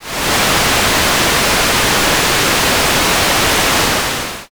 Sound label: rain